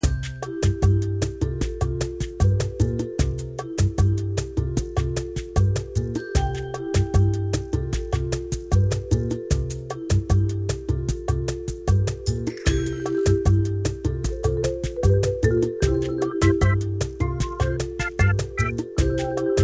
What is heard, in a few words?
Park Music; Hector Episode 1 We Negotiate With Terrorists